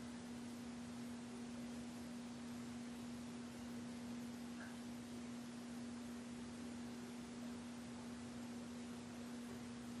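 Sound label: Ballroom Static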